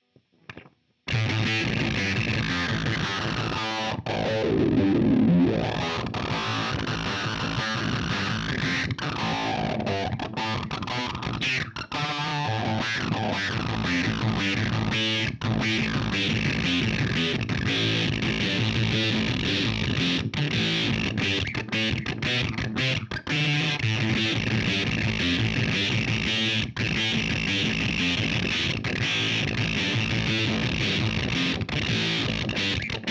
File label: free vintage; Walrus Audio Kangra